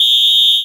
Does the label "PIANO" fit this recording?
No